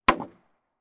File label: impact wooden planks x2 heavy drop on each other bang